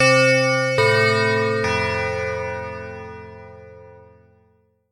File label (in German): gong schulglocke 3 mal; salamisound; herzwissen grundschule materialien